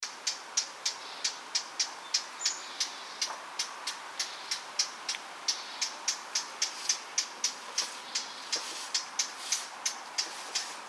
Sylvia atricapilla; SETE

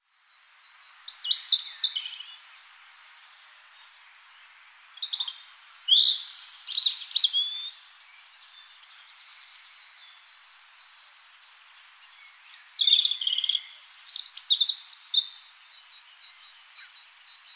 Erithacus rubecula; SETE

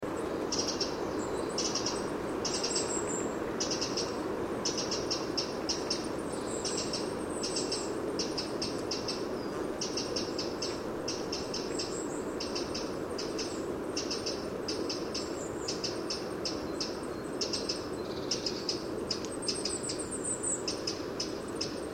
Troglodytes troglodytes; SETE

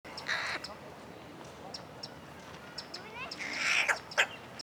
Corvus corone; SETE

